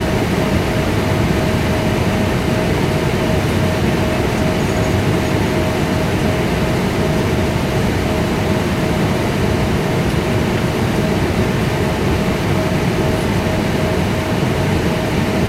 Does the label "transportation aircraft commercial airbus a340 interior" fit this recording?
Yes